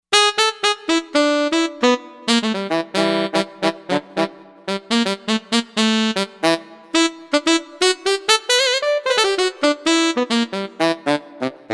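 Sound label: Reed Big Sax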